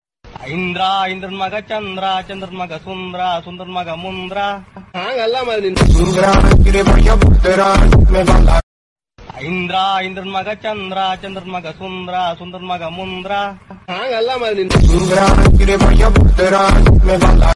remix bgm

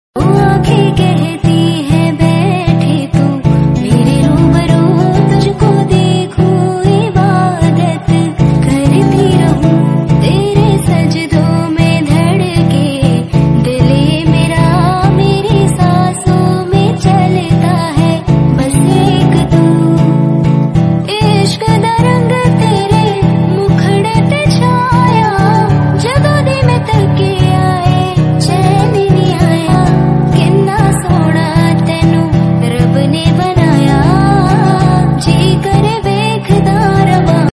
female cover hindi song

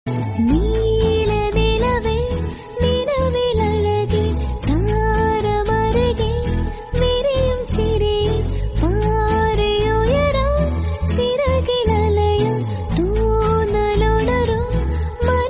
song tamil

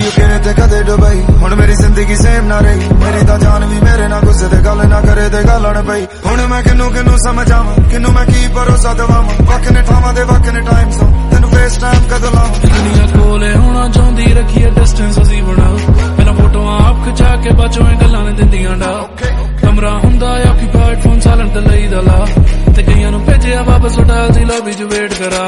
punjabi song

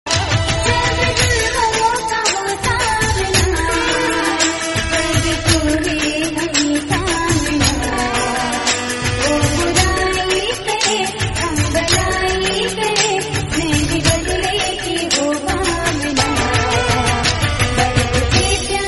garaba hindi

